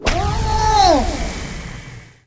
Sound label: Silence